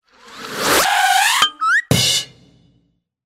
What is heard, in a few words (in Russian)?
multjashnyj zvuk